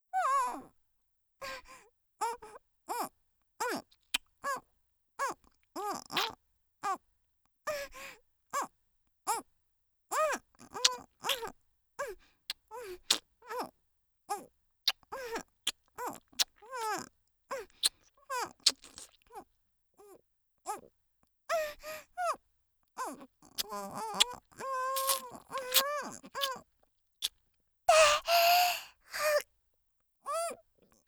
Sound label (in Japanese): フェラ イマラチオ